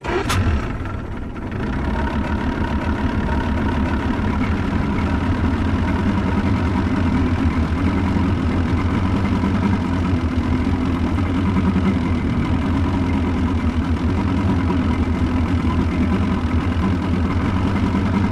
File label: motorboat start idle